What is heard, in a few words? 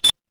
ui beep